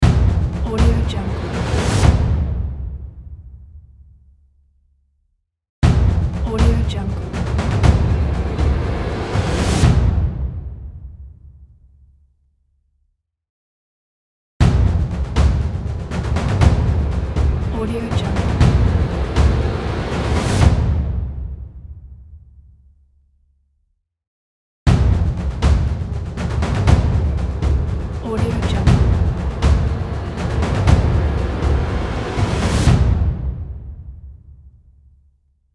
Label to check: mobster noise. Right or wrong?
Wrong